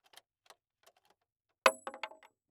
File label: Weapon Bullet Shell 270 Rifle Eject Shells On Boardwalk; AdobeCC